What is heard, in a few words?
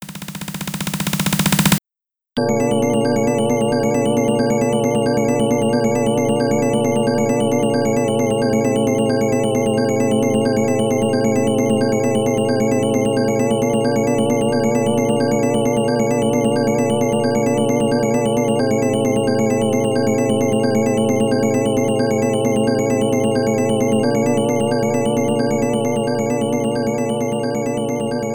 Sub-Boss; Stage 3, 5, 7 Sub-Boss; Aliens; arcade